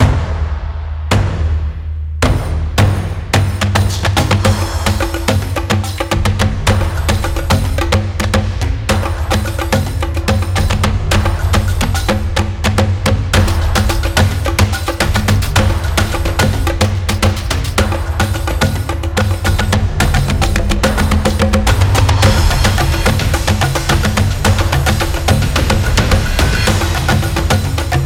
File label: War Drums; percussion